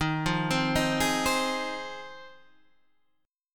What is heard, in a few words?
F Minor 7th D sharp